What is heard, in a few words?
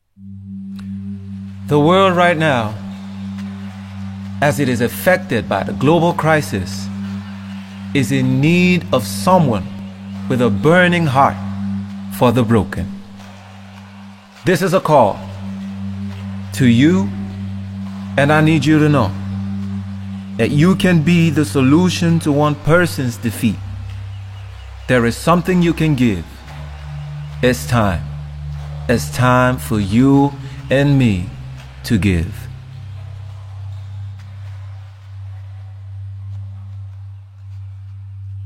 Oriental Choir Offertory